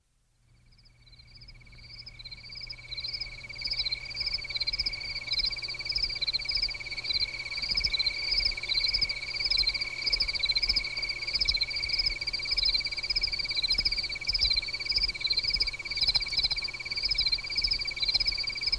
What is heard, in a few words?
Cricket Sound Effect